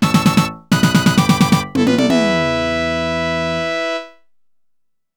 Pattern Clear; Konami; A-Jax; Gaisen 1

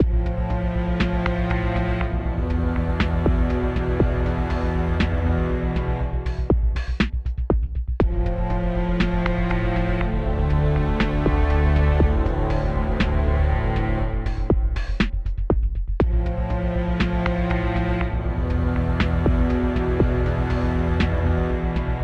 Lose; door kickers gamerip 2014

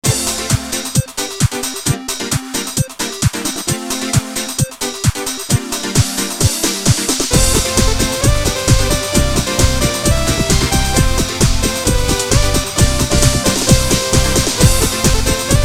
Swinging; doki doki sister paradise 2 special bgm collection

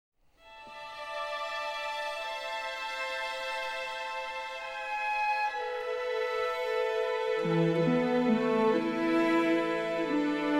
Six Horns; Dragon Quest III; Symphonic Suite Dragon Quest

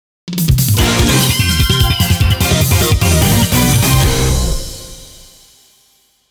WIN Screen BGM; Phantom Breaker

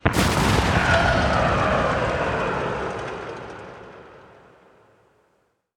onscreen appear

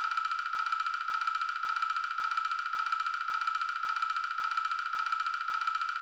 Scroll Fx Ratel

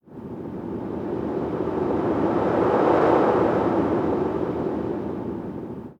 Meteor; We Are the Dwarves

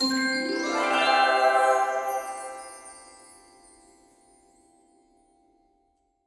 Puzzle piece; Scrat

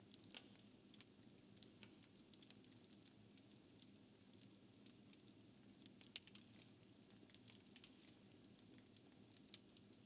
Fire Loop; we are the dwarves gamerip 2016

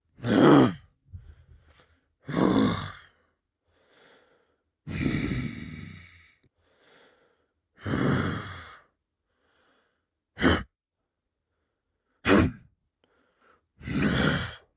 Stag ambient loop

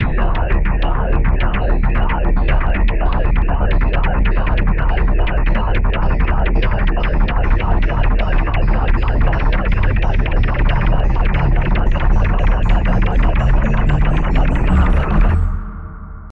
Caught Music; Night of the Consumers

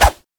Fishmen Bomb launch; we are the dwarves gamerip 2016

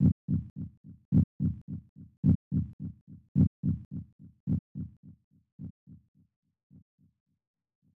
Heart Beat; Secret of the Stars; snes

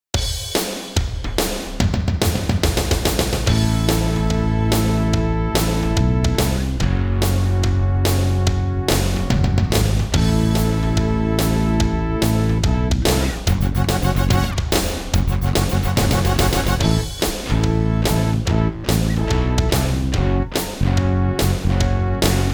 Outdoors; Dark Sun Wake of the Ravager; ms dos

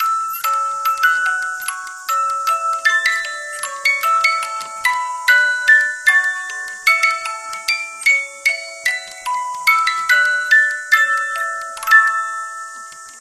Music Box

